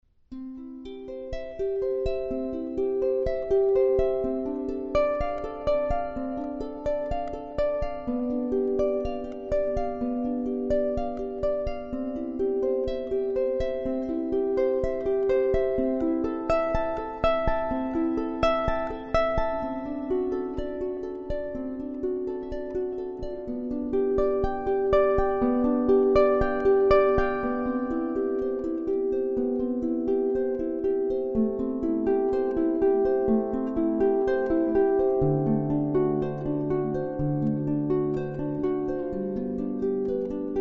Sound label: Prelude in C, harp